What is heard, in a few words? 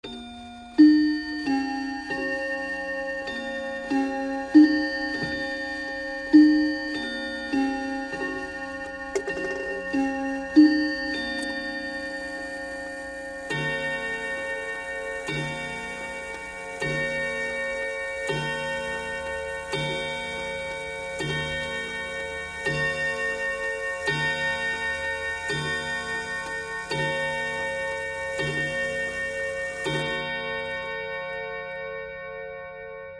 churchbell